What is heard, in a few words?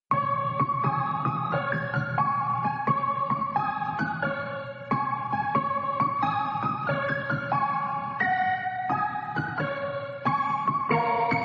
laughing caja musical; Laughing jack